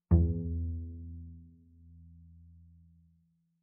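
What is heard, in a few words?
Bass pizzicato fortissimo sul A E2, Double Bass; MIS Pitches - 2014; Strings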